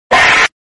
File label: goofy scream